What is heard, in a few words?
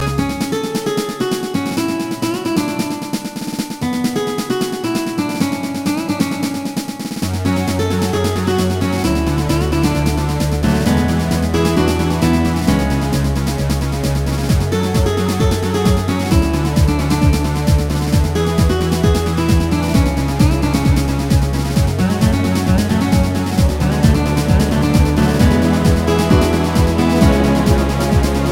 Techno